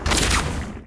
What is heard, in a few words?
Bow; nox